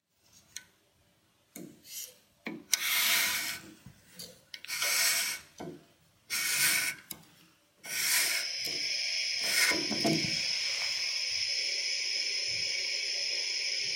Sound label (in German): Heizen Rauschen Wärmen